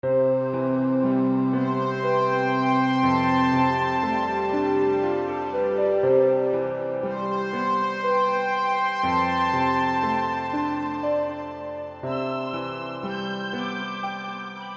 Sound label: Piano In Night